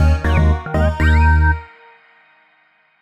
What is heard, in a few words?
End Of Level; xmoto